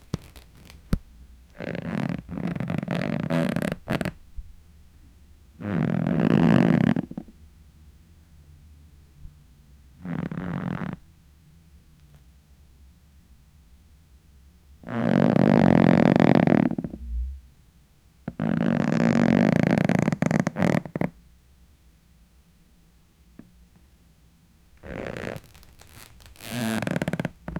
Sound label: bed creaks under stra